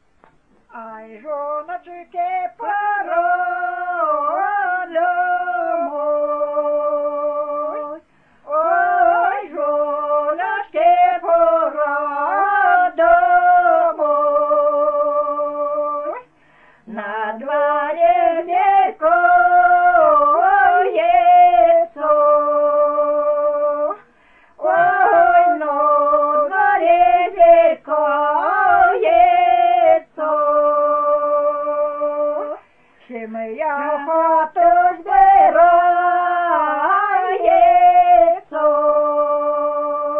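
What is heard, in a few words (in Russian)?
Жнивные песни Невельского района; д.Усово; "Ай, жиноч(ы)ки пора домой"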